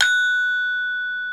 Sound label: BRONZE, Bronze Gamelan; E-MU Formula 4000 Series Vol. 4; Earth Tones